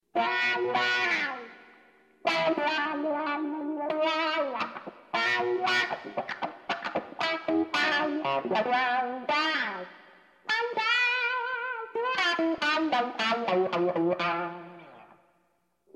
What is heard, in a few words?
Vox Clyde McCoy Picture Wah Replica